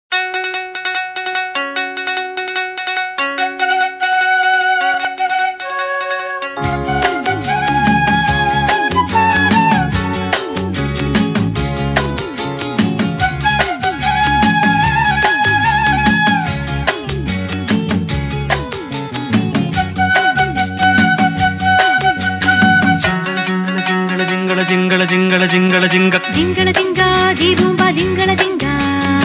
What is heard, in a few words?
tamil